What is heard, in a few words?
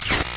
draw start; Ben 10 - Escape Route